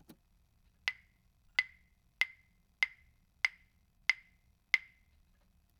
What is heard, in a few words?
claves